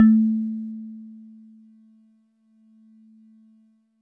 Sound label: Warble Celeste DX100 A-1